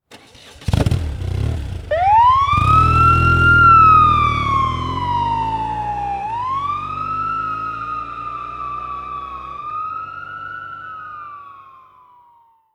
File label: Motorcycle Police Pull Away